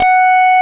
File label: Korg CX3, F#5; Back In Time Records; Korg Universe VOL-1